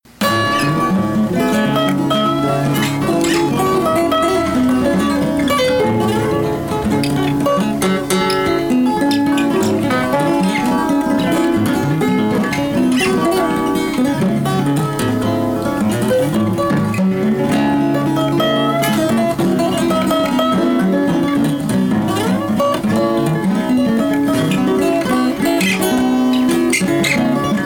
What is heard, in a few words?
GUITAR